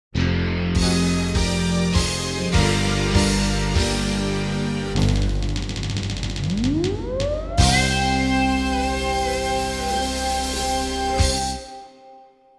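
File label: Fanfare - Instrumental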